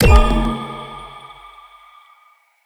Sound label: lantern